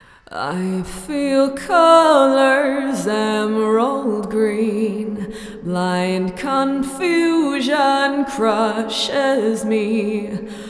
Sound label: Female Vocal